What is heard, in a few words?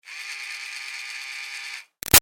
Toy Fairy Wand Med Far Run Short; Miscellaneous Toy; Sound Effects:Toys; Big Room Sound